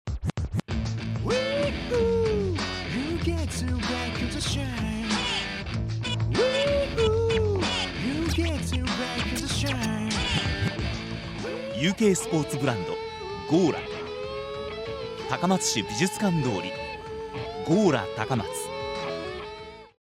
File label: electric ver.